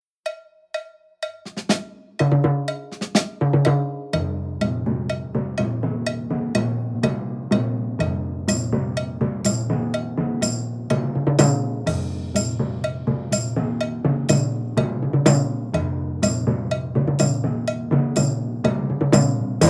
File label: Timp Ting Mix